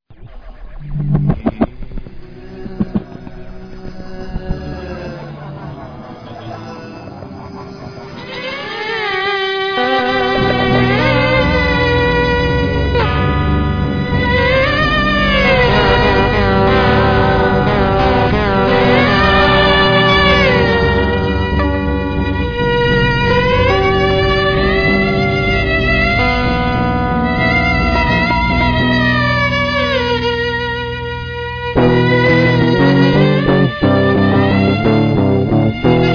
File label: arabic